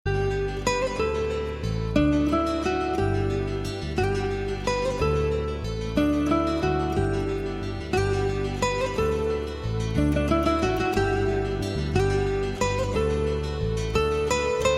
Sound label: Alaturka Guitar